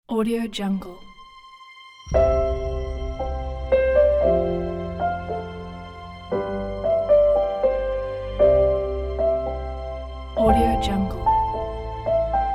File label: piano inspiring